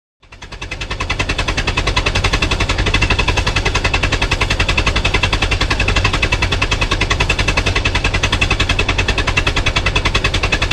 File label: Automobile; Idle / Away; Model 't' Ford Constant Idling.